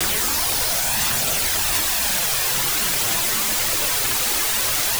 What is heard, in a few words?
flanger